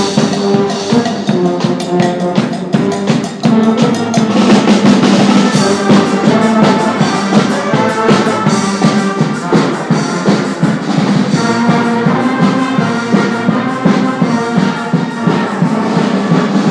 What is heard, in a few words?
fanfare rue daguerre